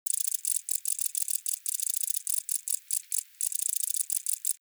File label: calling purring